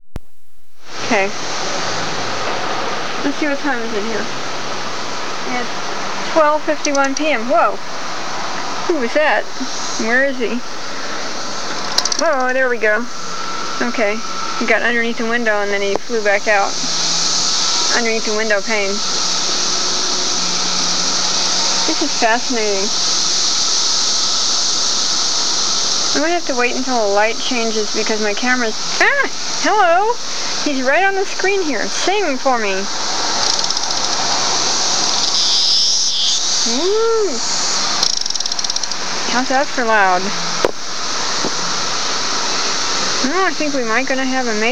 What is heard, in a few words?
cicadas